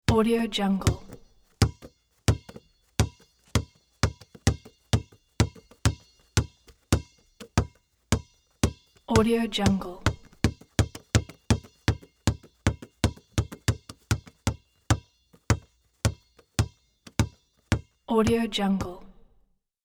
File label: basketball dribble